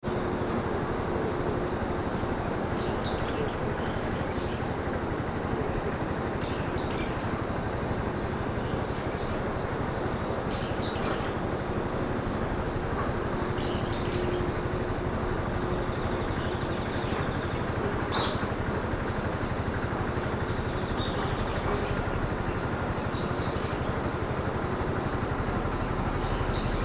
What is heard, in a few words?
city bird